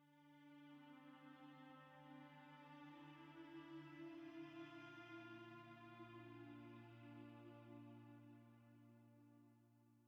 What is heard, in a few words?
cello duet; lethians crossing; Tyranny Gold Edition